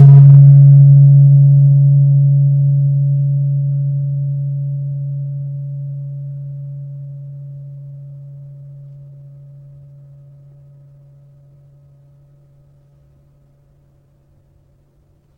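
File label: Slentem D3, gravity harps tuned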